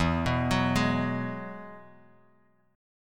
E Major Add 9th E down Guitar Open F; arpeggiated; JGuitar

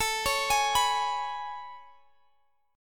A Major 9th

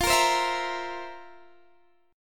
F Major 7th Suspended 4th, Guitar, Half Step Down

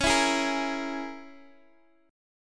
Db Minor 6th Db down Guitar DADGAD; strummed; Dbm6

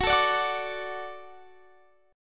F sharp Minor Sharp 5th F sharp Guitar Drop D

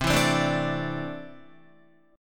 C 6th Add 9th C down Guitar; C6add9 strummed

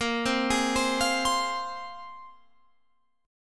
Bb 7th Suspended 2nd Bb down Guitar Open F; arpeggiated; Bb7sus2

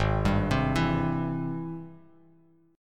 Ab Augmented Major 7th Ab Bass Standard; arpeggiated; 4,10,10,9; Ab+M7; JGuitar

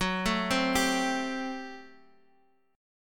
G Major 7th Suspended 2nd Suspended 4th F sharp

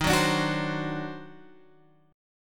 D sharp Minor Major 13th D sharp Guitar Open G

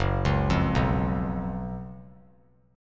Gb Major 7th Suspended 4th Gb; GbM7sus4; arpeggiated; 6,8,8,9; JGuitar

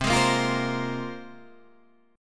Bb 7th Suspended 2nd C

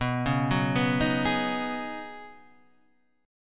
Db 7th Suspended 2nd Suspended 4th B, chord shape

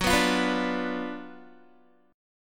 Gb Major 7th Suspended 4th Sharp 5th, Guitar; GbM7sus4#5 strummed; JGuitar

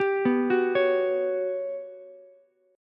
G Major Sharp 11th G down Ukulele C6; GM#11 arpeggiated

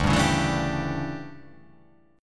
D Major 7th Suspended 2nd Suspended 4th D

